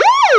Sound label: police; Bounce Ball